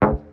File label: tom; bespoke - Floral v.1; drum oneshots